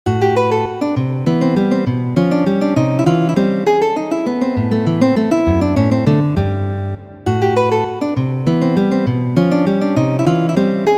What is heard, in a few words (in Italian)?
valses, guitar